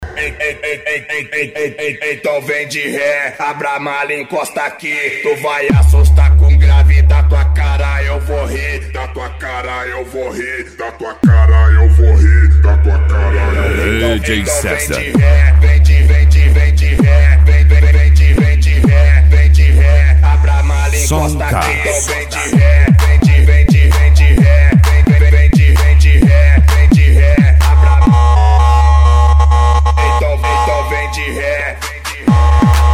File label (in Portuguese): som car; especial na balada